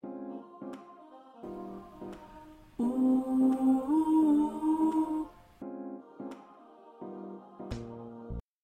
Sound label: Backup Voice